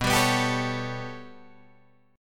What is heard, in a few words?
B 7th Suspended 2nd Sharp 5th down Guitar Drop D, chord